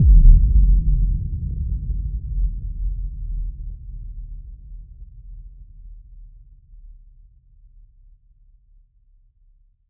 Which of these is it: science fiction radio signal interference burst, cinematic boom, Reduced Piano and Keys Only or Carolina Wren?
cinematic boom